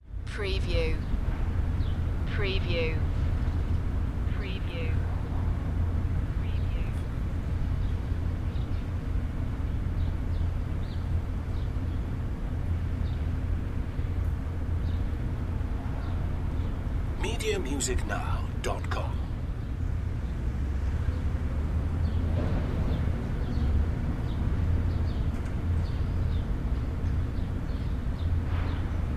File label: Alley Tone Urban Air